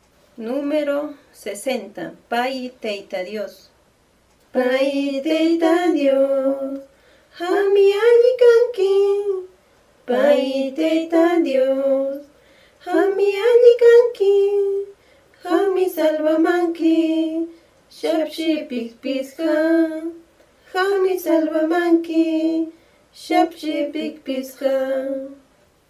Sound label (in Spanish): cancion